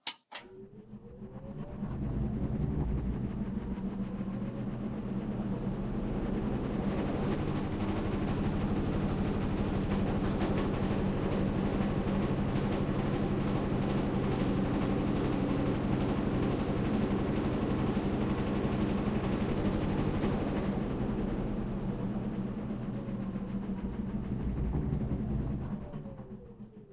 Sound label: Washing machine Turned on; Phasmophobia